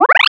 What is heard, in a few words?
Arcade Power Up; Video Game Sounds; 1001 Sound Effects